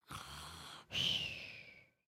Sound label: Sleep, creatures organic; Lego; Strombie